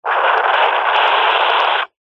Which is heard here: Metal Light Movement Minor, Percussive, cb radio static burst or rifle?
cb radio static burst